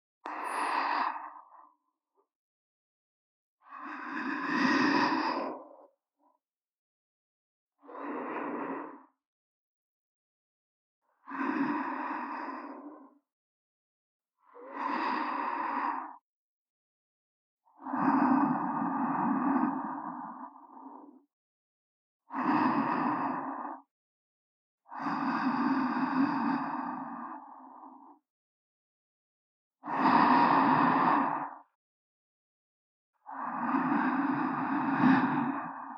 寝息(右) (ループ); メイド様; SEあり

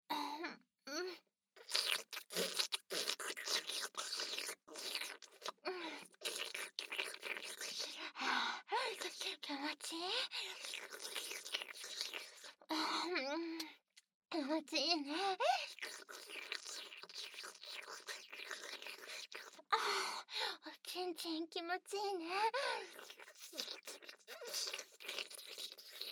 フェラ, ASMR; アドリブ集

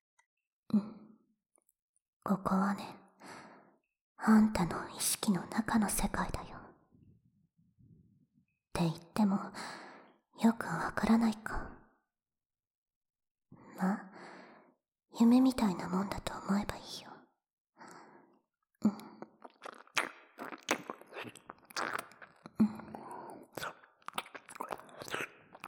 ベロチュー・ぐっぽり耳舐め・手コキ; 敗北した勇者、敵のダウナーサキュバスに捕らわれる……。とろとろおマンコで溶かされるお話【敗北×えっちな分からせ】; （夢の世界）

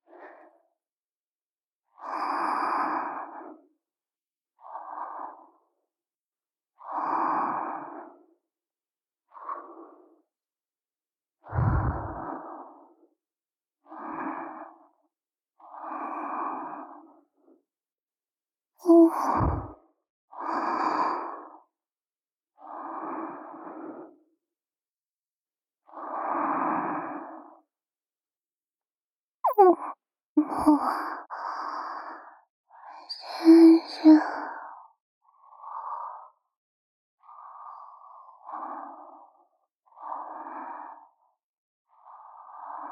無知生徒の寝息(右)(ループ); SEあり

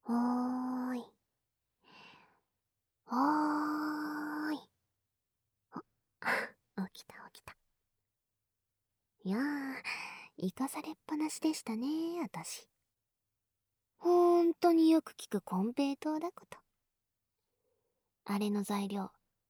終わらない耳舐めセックス, ASMR

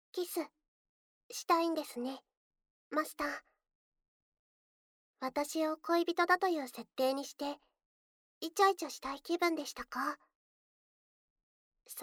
エアキス; 勃起パート; ウォーミングアップ辛口

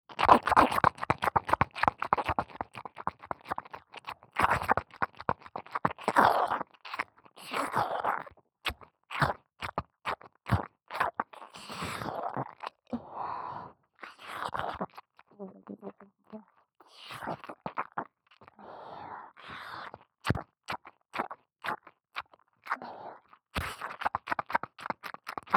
耳舐め・激しい・左耳; マリエッタ

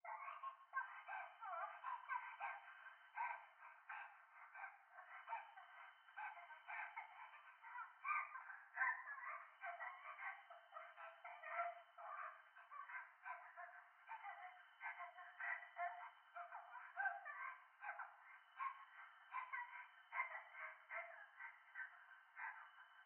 トイレ 可愛い喘ぎ 山奥

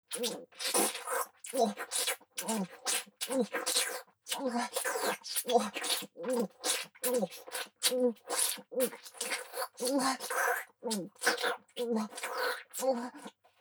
フェラチオ 激しい

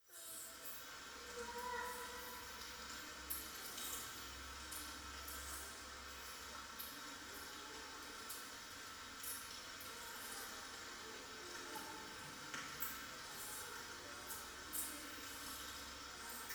トイレ イラマチオ 雨

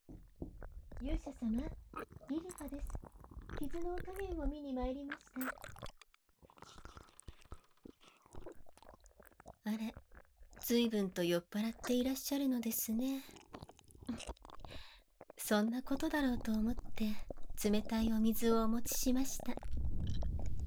耳舐めされながら清楚なヒーラー聖女に乳首舐め・フェラ・手コキ・素股・騎乗位・ディープキス・正常位される音声; 26分間耳舐めされながら清楚なヒーラー聖女に乳首舐め・フェラ・手コキ・素股・騎乗位・ディープキス・正常位される音声

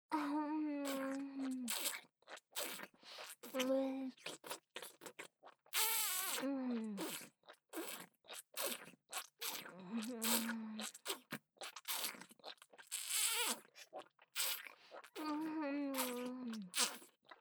フェラ, ASMR; アドリブ集

